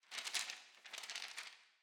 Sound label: witch lodge book